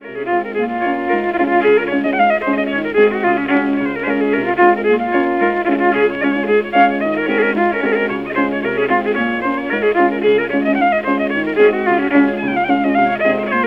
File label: Irish fiddle tunes